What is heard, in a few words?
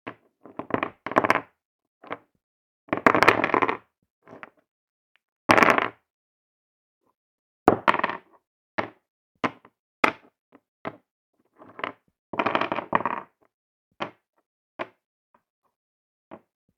Toys Wooden Bricks; Miscellaneous Toy Sound Effects